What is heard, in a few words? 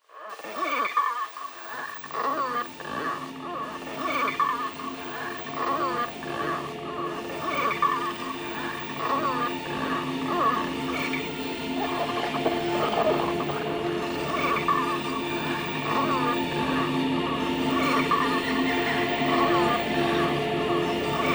Frogs Paradise vocal; Milinal